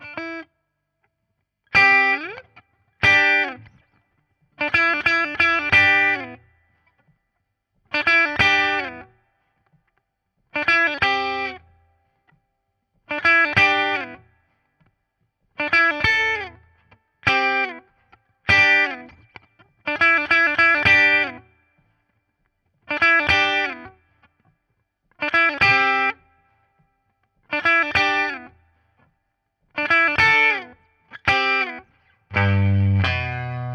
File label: Guit Solo, RNR for piano